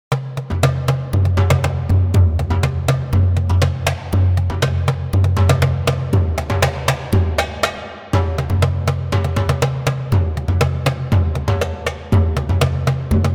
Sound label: Percussion; Hans Zimmer Percussion Prof